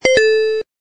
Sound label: checkpoint; sonic